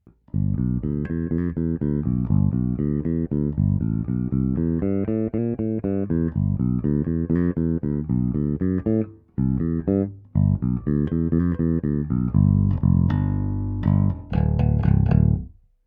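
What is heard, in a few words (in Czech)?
H-H prsty, nerezová ocel, Roundwoundy, Schecter SLS Elite-5 baskytara; Rotosound Swing RS665EL 45 - 130 Extra Long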